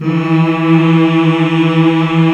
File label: MALE HUM E2